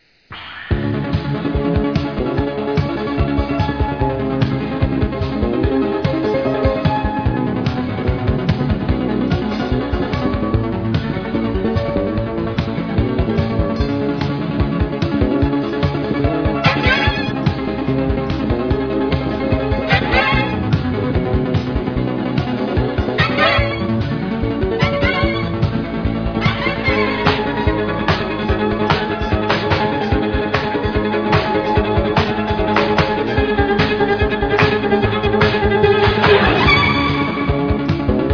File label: melody